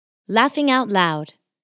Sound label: laughing